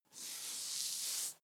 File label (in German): hair short hard comb single, friseur; berufe